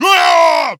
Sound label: yell; HeavenStudioPlus; ringside